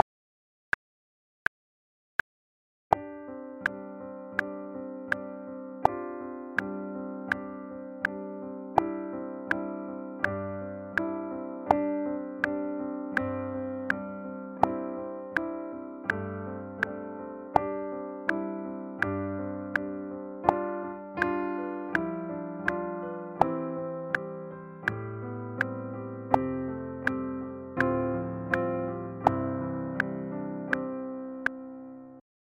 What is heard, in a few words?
Night Shadows with metronome